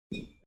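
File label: baseball sounds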